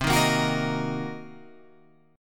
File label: C Minor 7th Flat 5th Guitar Open F, chord; Cm7b5; strummed